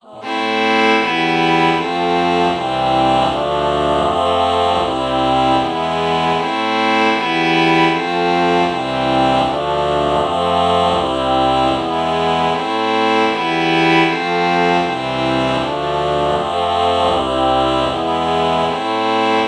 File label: ah violin chord morph